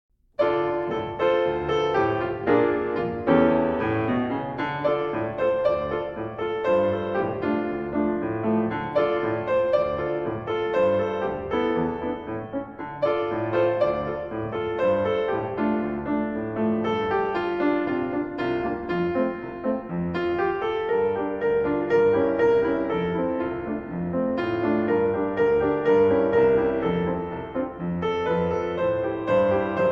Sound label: Piano Accompaniment